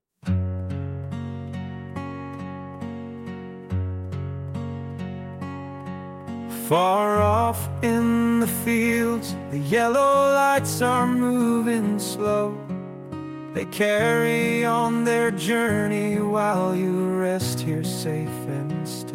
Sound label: lullaby, name songs; paul